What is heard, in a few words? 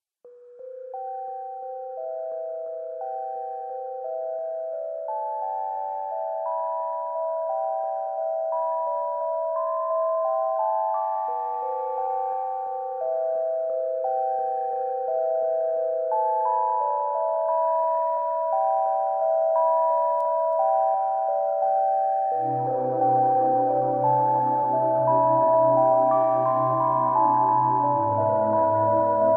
taniec aniolow, 432 Hz the heavenly dance; music in action